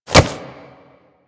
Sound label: mantle foley; characters; generic foley; Warframe